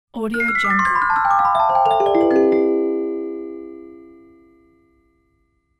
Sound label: magic descending ring bells; game